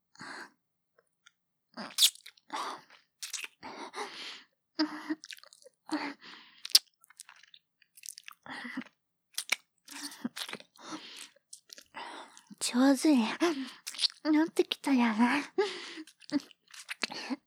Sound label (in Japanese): キス; 【マゾハーレム】